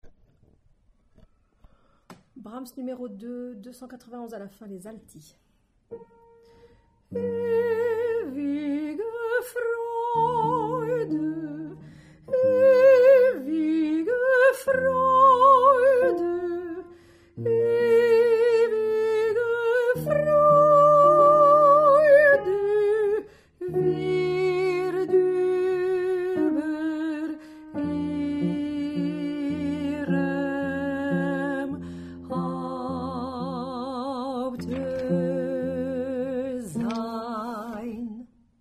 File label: fin Alto